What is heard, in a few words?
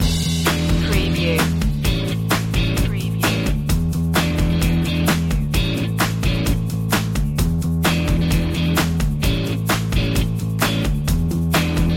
re rock funk loop